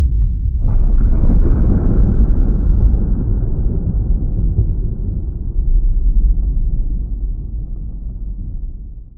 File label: server message